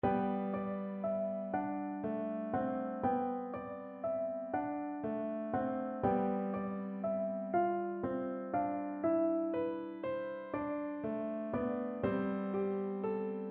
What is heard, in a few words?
vn pf; moniuszko; jako od wichru